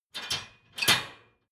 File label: dishwasher frame fold up